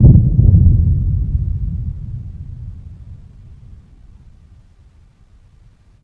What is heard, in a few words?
distant thump